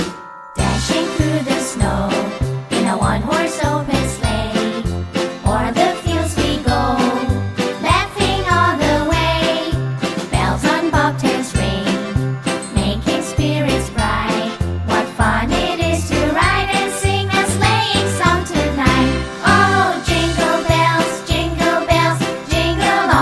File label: jingle bells